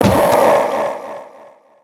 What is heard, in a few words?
ogre death